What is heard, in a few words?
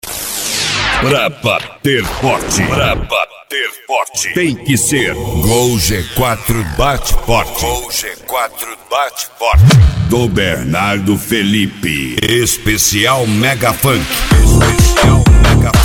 MegaFunk